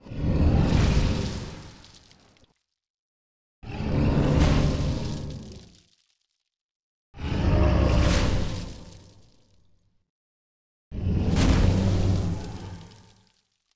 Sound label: Attack; Zullra